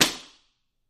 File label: Book Slam Slap Good